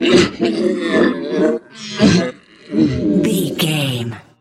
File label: troll monster death slow